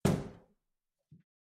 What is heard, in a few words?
Metal Footfall; soundscrate